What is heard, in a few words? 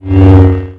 Hum slow move; WT588D; Arduino; Voice Memory Keyer; SabrSound2